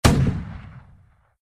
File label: Attack tank shell